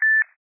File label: Beep once; AndroidZoom ir